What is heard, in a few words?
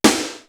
MODERN TWIN; East West; Global Sounds